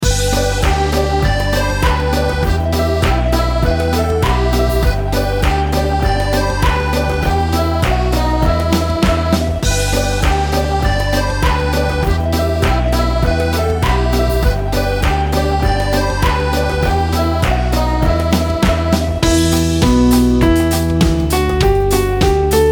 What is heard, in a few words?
karaoke